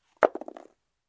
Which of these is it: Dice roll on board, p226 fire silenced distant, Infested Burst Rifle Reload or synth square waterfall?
Dice roll on board